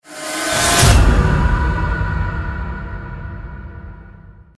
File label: blood echoes retrieved